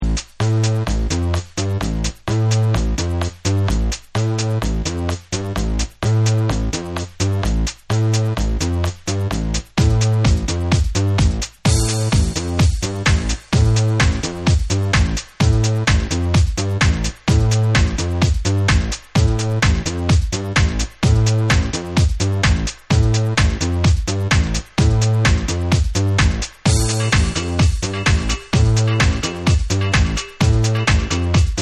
Deep Hard Dub Mix

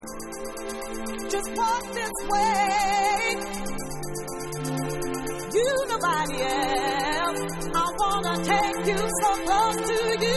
Acappella

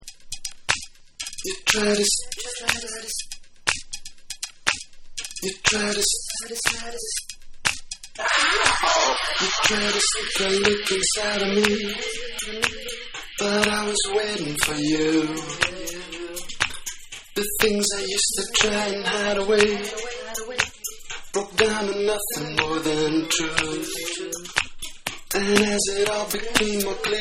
SELF ABUSE Percapella

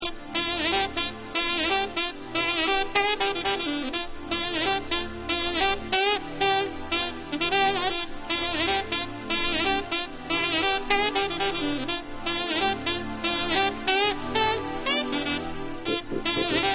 Sax Apella Version

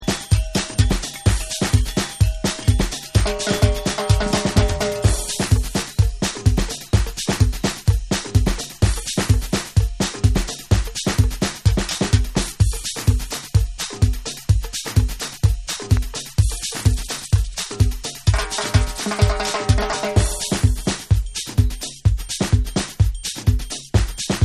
Tribal Mix

